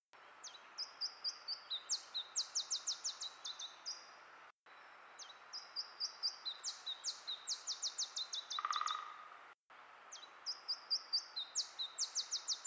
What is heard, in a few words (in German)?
iberischer zilpzalp